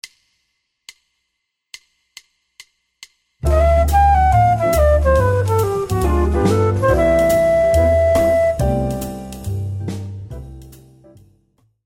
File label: bebop scale